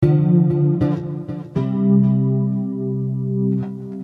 Bass Amb Chords D,C 119, Electric

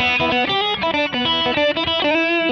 Lead Boogie E; Loops

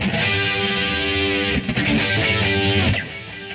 E5 134 rhythm

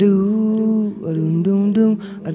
Vocal Echoes

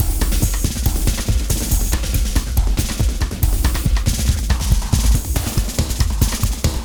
Wild Ride 140

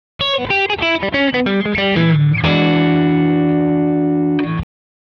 Outro Boogie E; Loops

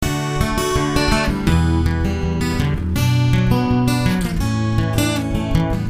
Picking D,Em,Bm,A 83

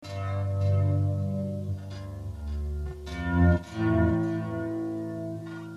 Bass Amb Vol G,A 084, Electric

